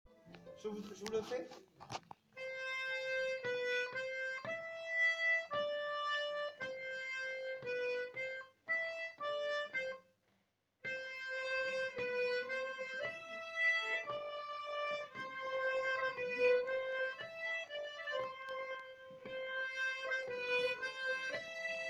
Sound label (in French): Plan violons; Musique d-ensemble